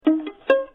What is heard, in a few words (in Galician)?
pisadas